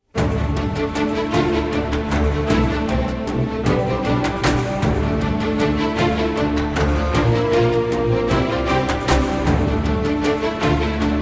Instrumental

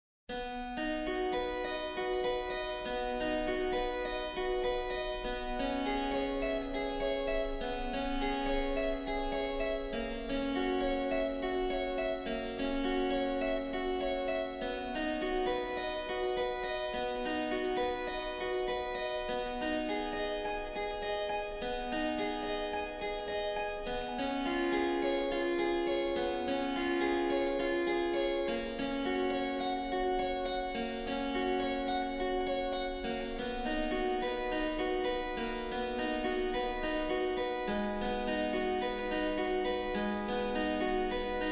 Prelude & Fugue in C; The Well-Tempered Clavier, Book 1; BWV 846

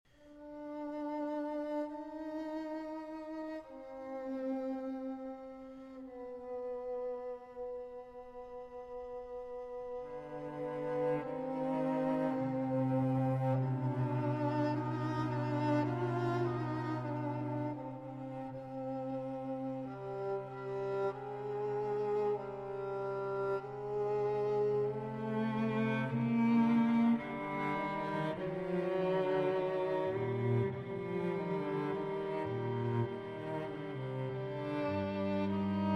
String Quartet No. 8 in C Minor, 5. Largo

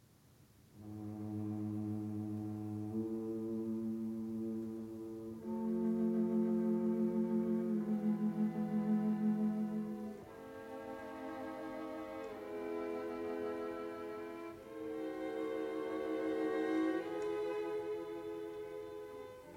String Quartet No. 15 in A Minor; Op. 132; I. Assai Sostenuto - Allegro - Adagio - Allegro

